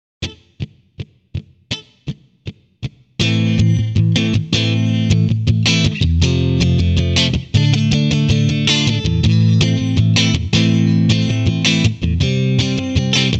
Lead Playing Sample Chord Progression, electric guitar; electric guitar performance diploma